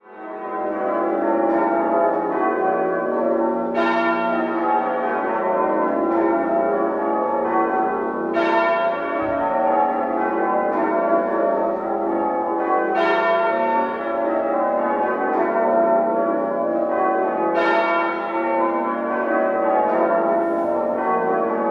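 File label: Muffled Grandsire, bells; Passchendaele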